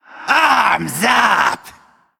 male skeleton, Attack

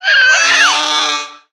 Azure Explorer Death

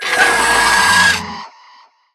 Humongous Owl Play

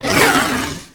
Mana Wyrm Death

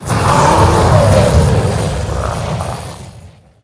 Molten Rock Death

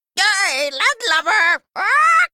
Male Parrot Attack

